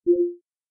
media insert request; stereo; kde; ocean

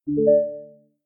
power plug; stereo; ocean

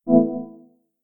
dialog error; stereo; kde; ocean

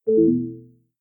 power unplug; stereo; kde; ocean

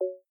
audio volume change; stereo; ocean

